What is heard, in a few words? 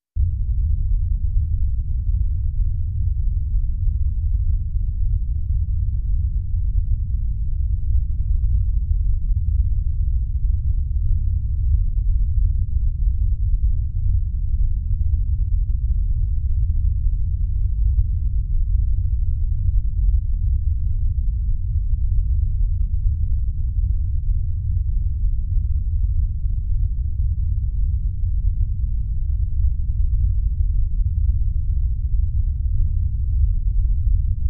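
Graveyard Low Rumbling, avalanche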